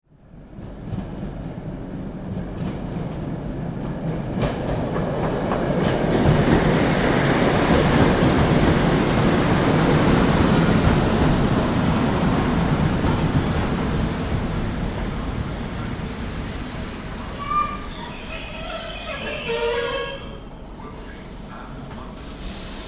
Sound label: Train Electric Up and Stop Door Slams